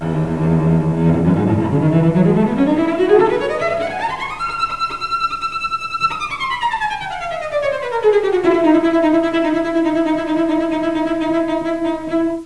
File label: major chromatic scale, cello